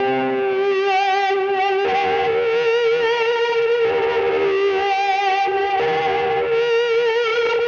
ELEC GUIT ETHNO 125, Guitar; eJay

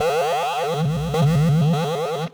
S&H Snip G, Noise Kit; Cyclotronic Resonator

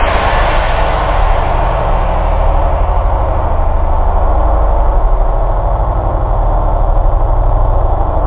rasp, guitar; da capo vol1

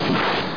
dead rone; warpath

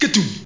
vocal; Zoom - Release 2 (1996); Active Software; vocals

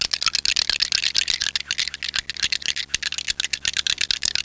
dice; WAV Sound Effects; parks

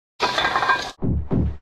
Cannon Load Cannon; Pirates Of Treasure Island

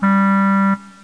WOOD

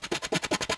chalking; PC Gamer IT CD 45

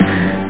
sdrum

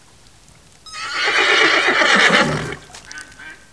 horse

